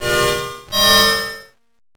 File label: sample bandoneon snippet fast